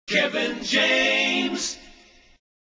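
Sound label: jingles logoset accapella; priority one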